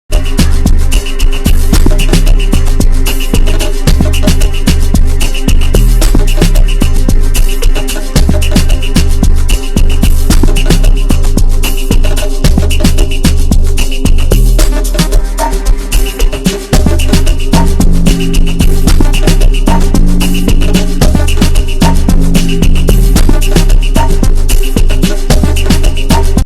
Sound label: Mellow Sleazy Possessed Whistle